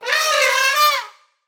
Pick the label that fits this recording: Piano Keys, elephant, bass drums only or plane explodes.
elephant